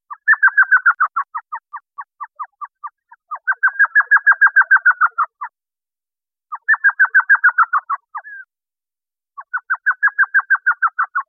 Elf Owl; ASU; Ask A Biologist

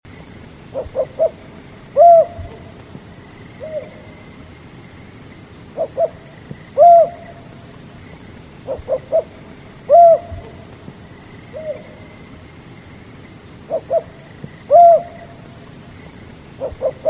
Rufous Banded Owl Pair; Sjoerd Mayer

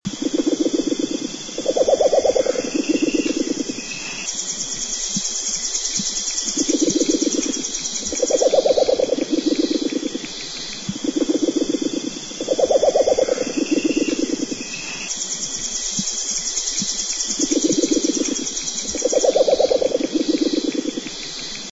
Tawney Browed Owl Duet; John Van Der Woude

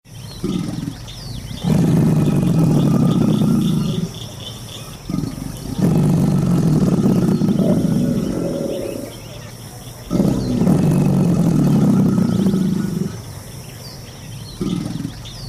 American Alligator; NPS

